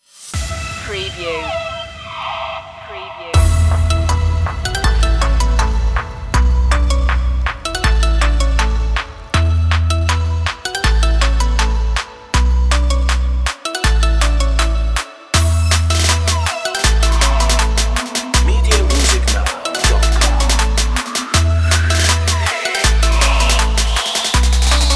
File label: ride the waves no vocal fx